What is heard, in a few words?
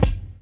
BD, Dance Mega Drums, HIP; 90 s Sample CDs; Best Service